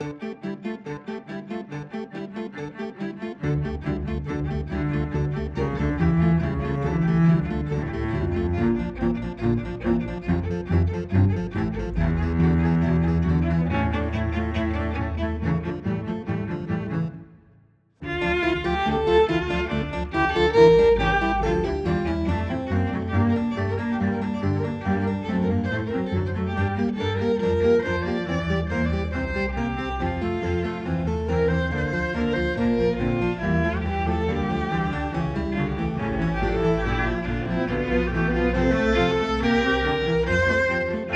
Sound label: The Crowded Divorce Underscore, Classical Cinematic, Witty